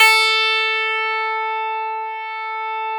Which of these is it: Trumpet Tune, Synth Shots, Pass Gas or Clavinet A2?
Clavinet A2